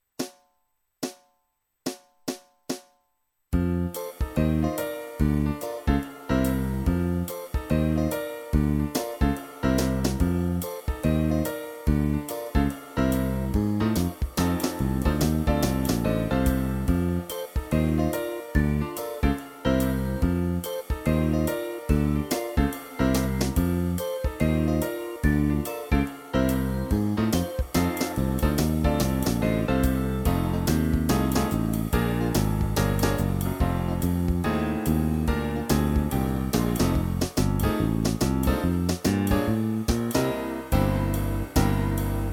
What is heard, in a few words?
no horn